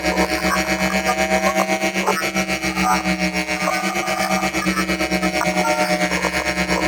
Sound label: Drone Flying Loop; Warframe; Nokko Colony; Drone Defense